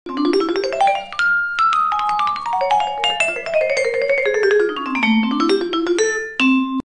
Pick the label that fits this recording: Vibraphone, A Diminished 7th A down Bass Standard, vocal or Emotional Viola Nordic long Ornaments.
Vibraphone